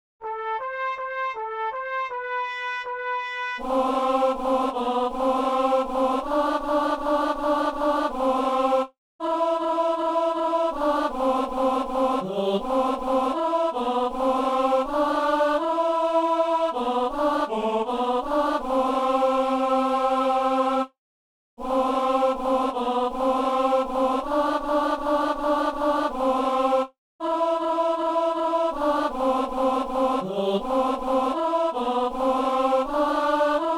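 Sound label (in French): célébrant et ténor; Messe de Saint Paul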